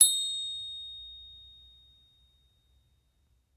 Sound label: Mid East Finger Cymbol; Mid East Finger Cymbol 1